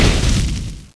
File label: hurt, paintball